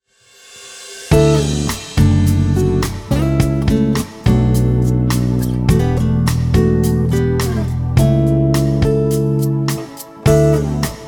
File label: Love chords